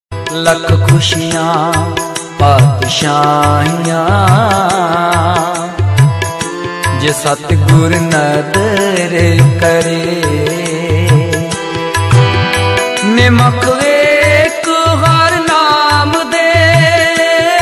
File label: Punjabi Dharmik Ringtone